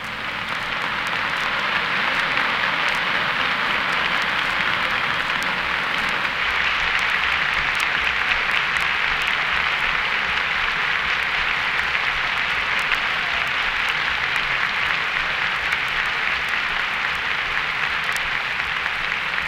Applause; Transition Back to Orchestra

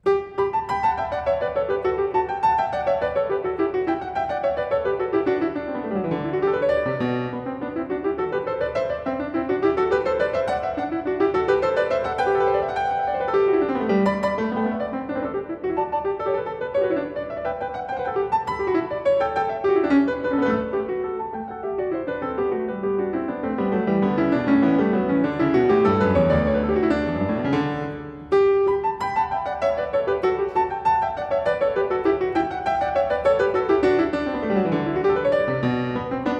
Goldberg Variations Var. 23. a 2 Clav; Bach; BWV 988; Live at Carnegie Hall, New York, 2025

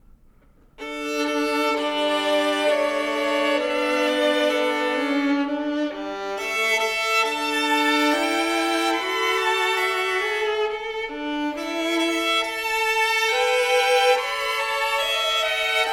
Duos for Two Violins, Soldier's Song

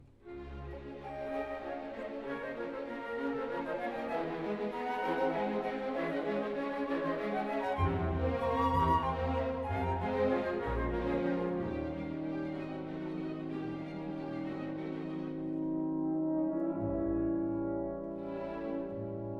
Tempo di valse; Turku Philharmonic Orchestra; Sibelius Scaramouche, Op. 71

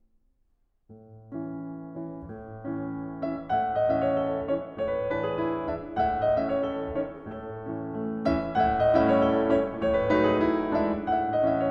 Halling, Piano; 25 Norwegian Folk Songs and Dances, Op. 17; Grieg

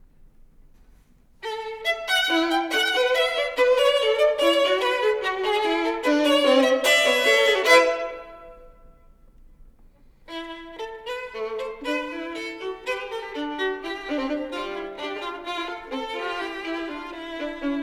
Duos for Two Violins; 44 Duos for Two Violins, Sz. 98 No. 30, New Year's Greeting III

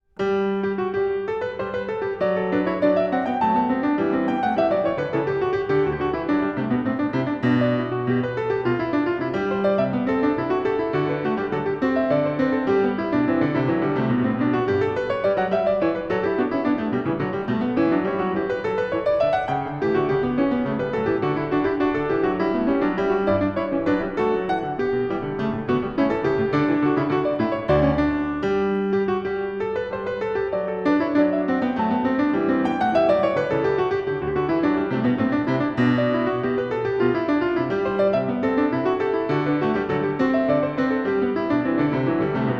a 1 Clav. Canone alla Quarta; Bach Goldberg Variations BWV 988 (Live)

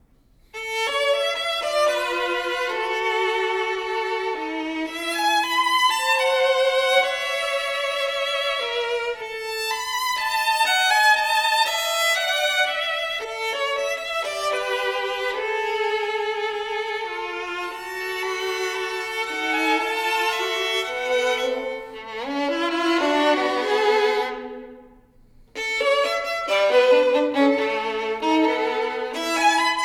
Duos for Two Violins, Hungarian March

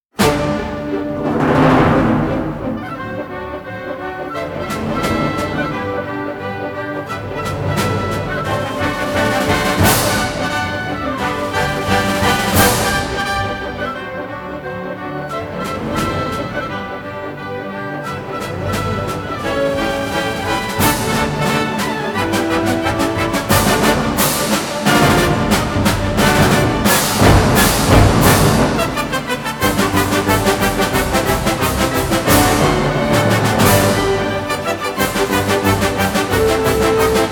Thunder and Lightning Polka; Johann Strauss II; Fritz Reiner; The Great Waltzes; Chicago 1961

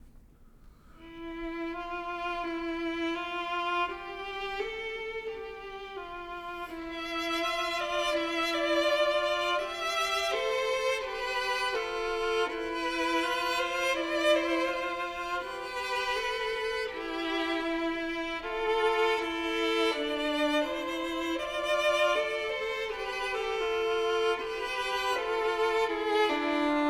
Duos for Two Violins, Ruthenian Song

